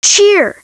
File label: cheer